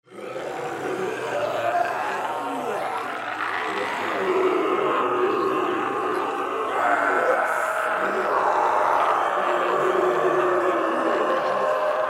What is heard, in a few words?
zombie crowd